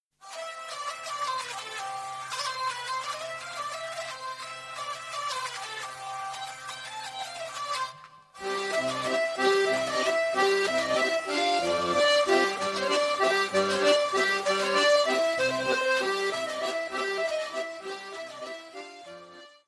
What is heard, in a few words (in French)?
La Varsovienne - France